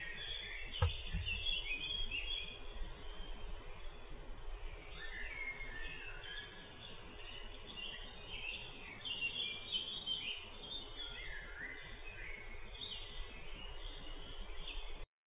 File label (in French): renard